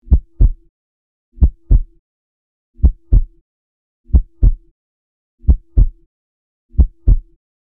Emergency medical kit stethoscope heartbeat regular